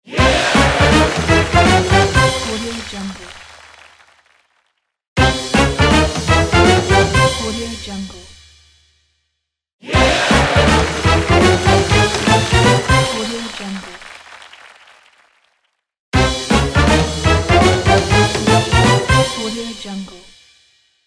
big band fanfare

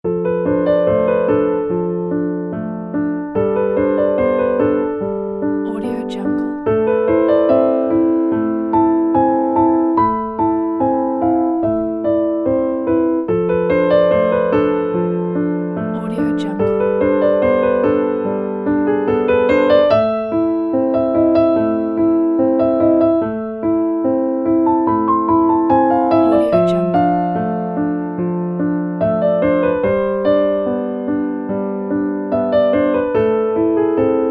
warm sad piano

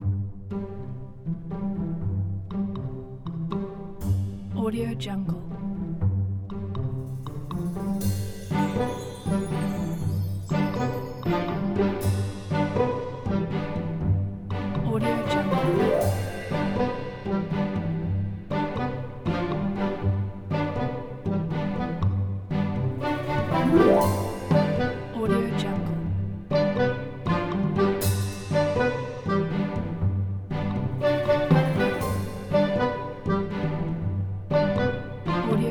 cartoons

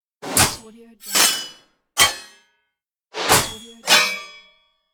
swords

